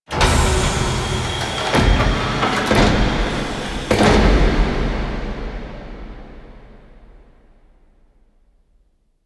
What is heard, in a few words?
Pod Open; Cinematics; Operator; Warframe; Orbiter Cradle